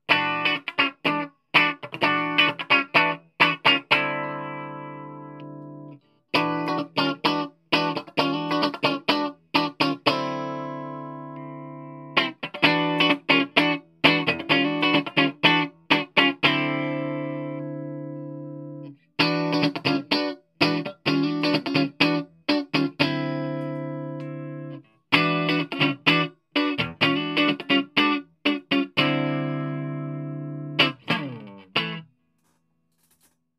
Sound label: strat cl